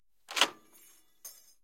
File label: 12mm m2 mech, guns tanks; War Thunder